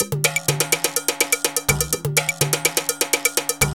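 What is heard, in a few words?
drum loops, left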